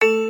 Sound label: step; Hot Scatter Dice